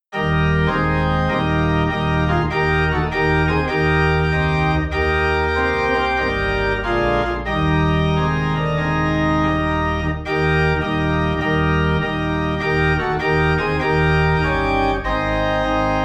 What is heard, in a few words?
Organ Low in E Flat